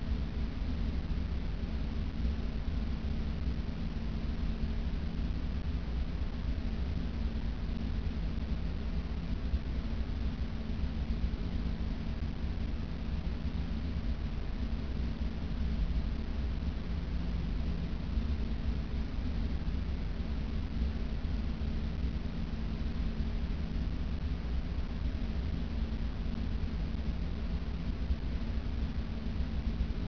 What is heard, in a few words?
facility rumble; gesource